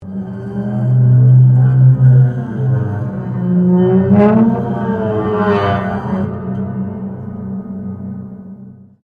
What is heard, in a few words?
Piano Upright Broken String Slide Up, Upright piano without keyboard; Pianos; Musical